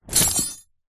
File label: fabric bag of metal hand tools set down; industrial; zapsplat